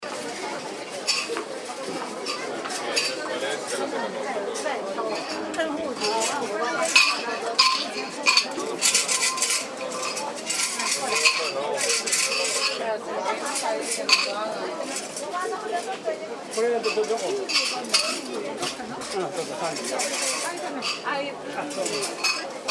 Crowd Chatter Clanks Tokyo Japan Market, Crowd walla, Tokyo, Japan; Market ambience with people talking in Japanese; Crowd Sound Effects; Tokyo Japan Market 01